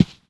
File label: footstep